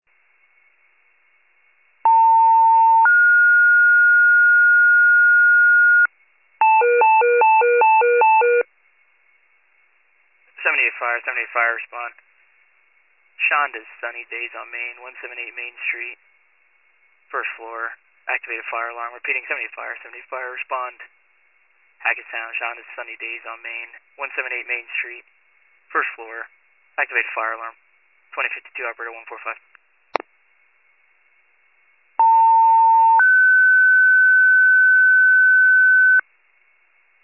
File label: FIRE pager alert